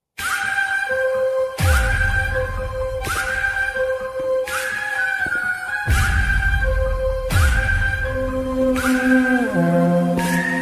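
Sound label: anthem background music; ravanasura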